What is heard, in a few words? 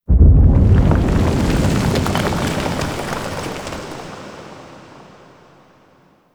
Dist Explosion Debris; Ambience; Warframe; Nokko Colony